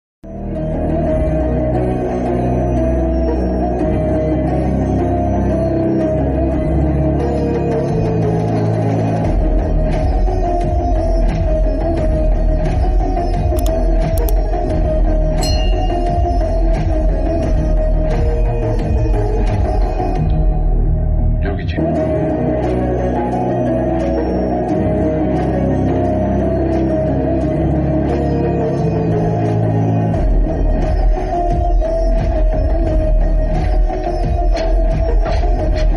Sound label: villain entry bgm ringtone; bhagavanth kesari